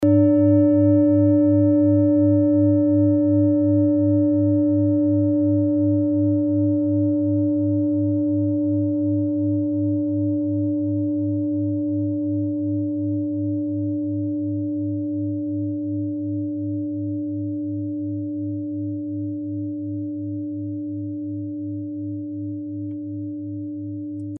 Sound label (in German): klangschale nepal, Klangschalen Nepal